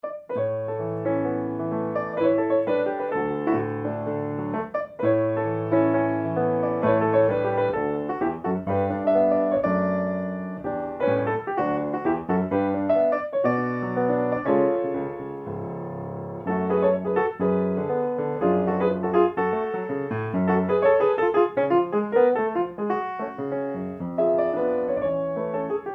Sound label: Water Song